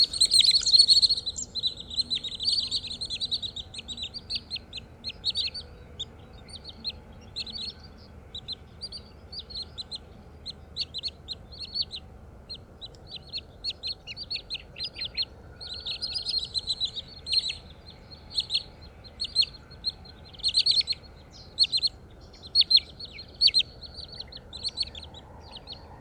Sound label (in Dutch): Bosruiter Tringa glareola